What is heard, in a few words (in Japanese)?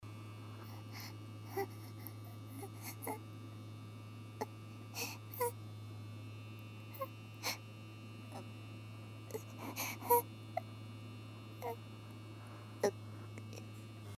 電マ 寸止め オナニー; 奴隷ちゃん